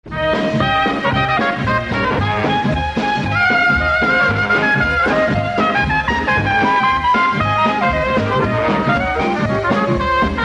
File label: Dixieland, Jingles